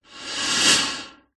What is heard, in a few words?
human blowing air into plastic tube